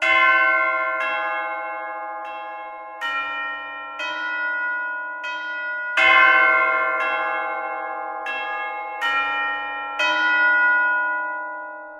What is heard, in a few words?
Chimes